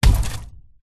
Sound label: impact body heavy hard throw against metal